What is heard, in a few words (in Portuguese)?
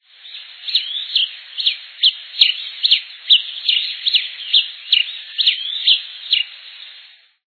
tico-tico-rei